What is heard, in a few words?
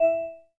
Class Harp, notifications; Oppo Find X5